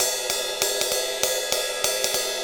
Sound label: Ride Cymbal Pattern